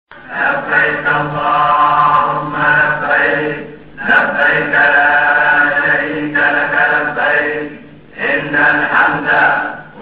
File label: labik ringtone